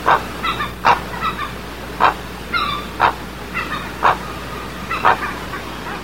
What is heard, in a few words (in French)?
heron